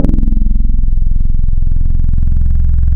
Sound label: HomeBass, wavetables; Waves Codex